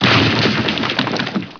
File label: break stone; Heretic II Demo